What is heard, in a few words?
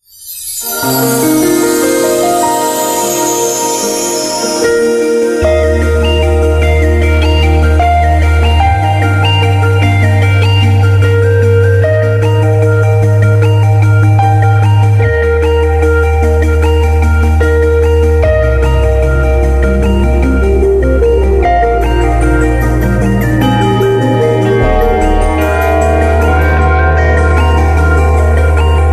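beautiful New Year melody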